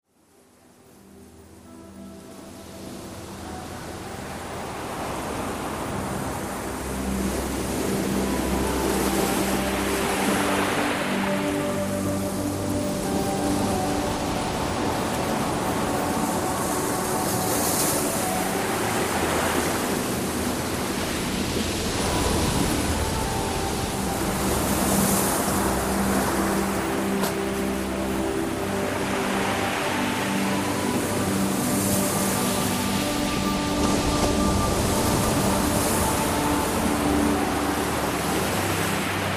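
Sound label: subliminal intuition west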